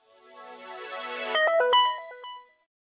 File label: Power On; L20 D105f